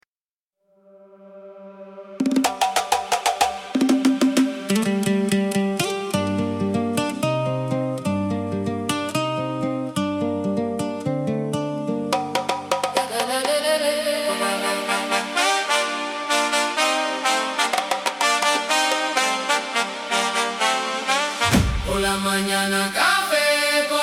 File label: afro house remix